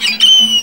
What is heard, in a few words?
VIO MISC, MISC VIOLINS; Best Service; World Colours